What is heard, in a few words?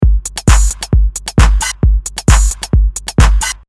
133 loco strght; Loop Mania Sampler Pack